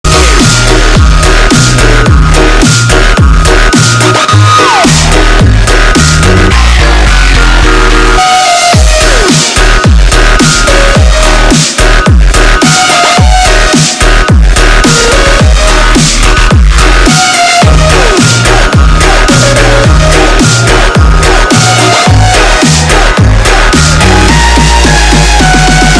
dubstep

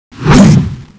attack; Revenant

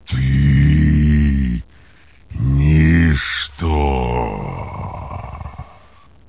gravity death; alien

